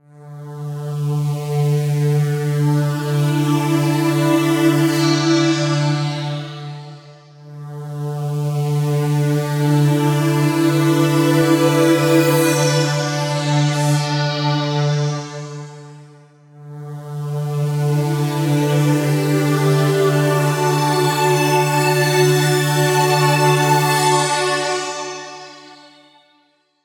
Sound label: Synplant 2 Flanger Things; Soniccharge